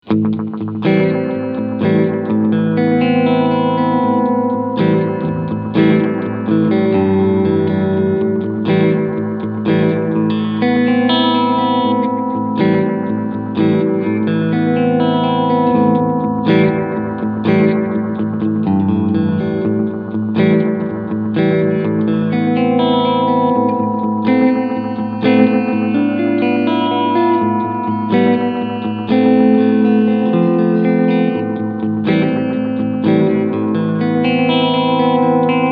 Echo Dream 2; Death by Audio

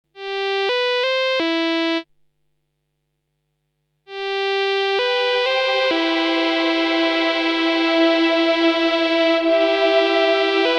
Cathedral Shimmer; Synth Mercury X

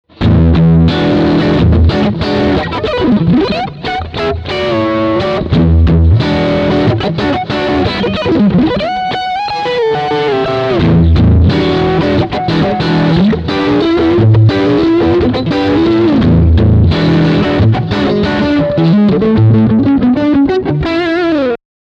Crunchy Riff Singlecoil

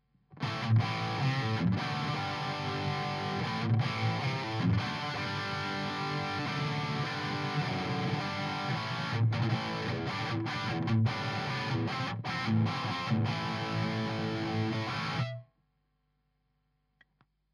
EHX 5150 Rhythm PRS SM57

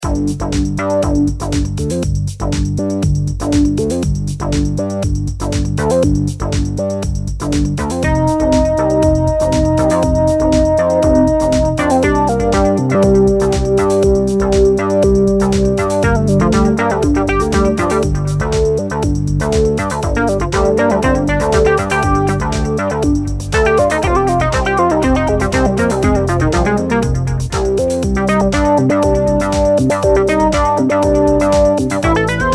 OB-E BassFuze Lead

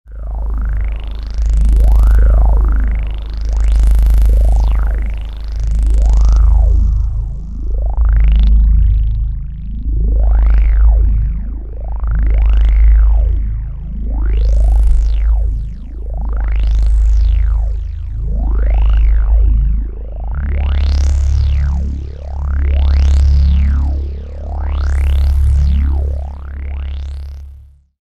cs30

